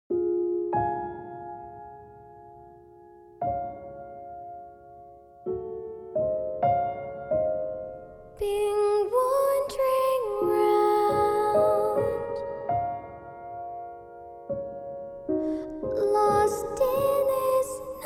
BGM Rain; toro and friends onsen town; toro to puzzle dokodemo issho; android

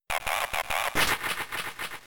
Extrasensory; Pokemon; Gen 3; attack moves; RSE

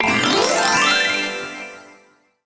Fanfare; Mario Kart Arcade GP 2

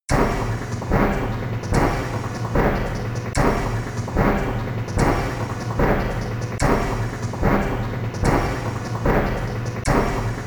Multiball Ready (Pre-Launch)

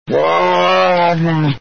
yelp; Voices; Red Baron; saber

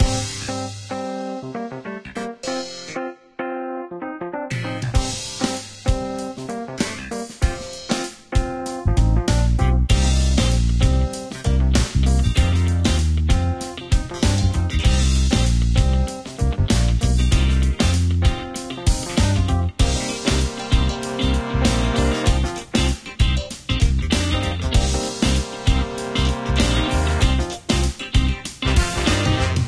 Smooth Music; Android

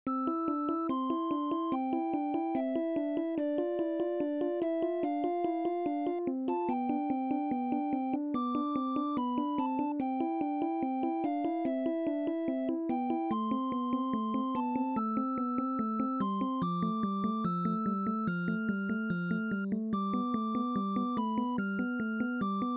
Roland SC-55; SimCity 2000

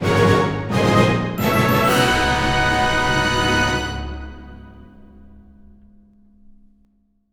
Jingle New Costume; balan wonderworld

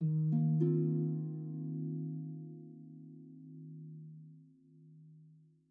Calm Harp; super mario maker 2; Peaceful SFX Raws - Otoasobi

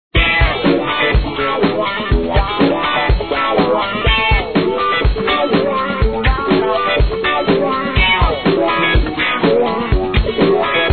Strat Strut Loop; SpongeBob SquarePants Reef Rumble

